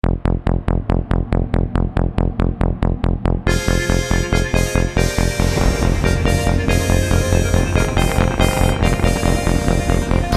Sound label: Openning Tune